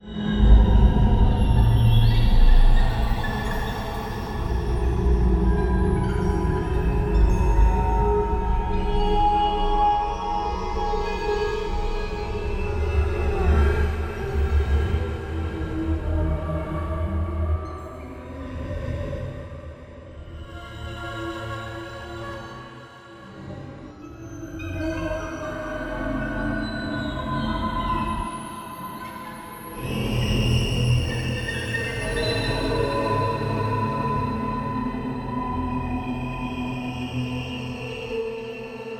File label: Ambient